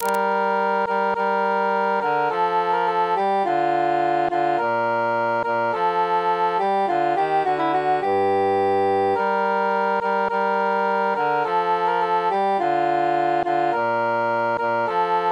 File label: tenor mute; pastime with good company